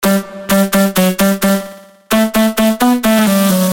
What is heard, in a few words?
Falling; sickrate; siik essentials iv